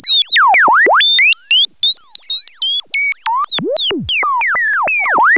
RM BEL LOOP, ANALOG LOOPS; Zero-G Ambient 1

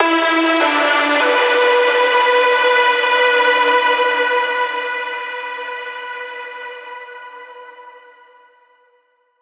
synth hit three notes Emin; Virtual Riot

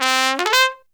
TRUMPET FX; Zero-G; Phantom Horns

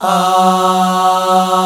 POP AHHS

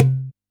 DJEMBE, Yamaha DD-65

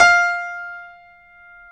PIA GRDPI, Roland

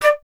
FLT FLTD, Flute; Miroslav Vitous - Solo Instruments VOL-2

